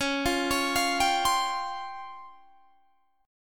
Db Major 7th Flat 5th; arpeggiated

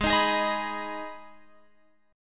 Minor, Guitar, Open F; G#m; strummed

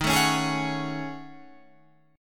F sharp Minor 6th Add 9th, Guitar

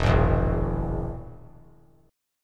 E Major Flat 5th; strummed; JGuitar

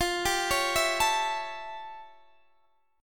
A 7th F, chord; A7/F; arpeggiated

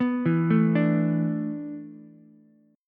A Suspended 2nd Suspended 4th, down, Ukulele; Asus2sus4; arpeggiated; JGuitar

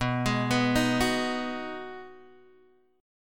B 6th B down Guitar Open G

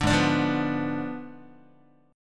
G sharp Diminished 7th, Guitar; G#dim7 strummed; JGuitar

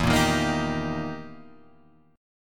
E Minor Major 7th Flat 5th, Guitar, Drop D; chord shape

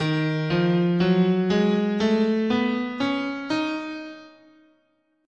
Eb Locrian sharp2, scale

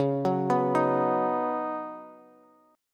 Db 7th Suspended 2nd Suspended 4th, Bass; JGuitar

